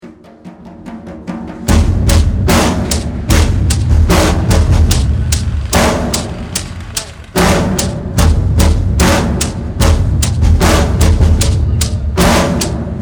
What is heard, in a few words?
Big beat samp